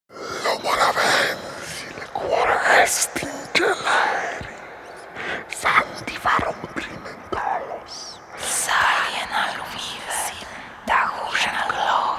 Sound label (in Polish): szepty solo i grupowe; pssst instruments